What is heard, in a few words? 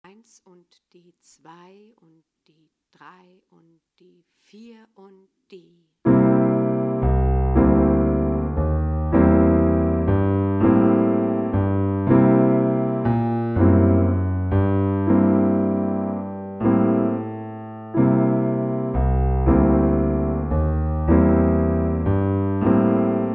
Bass to go slow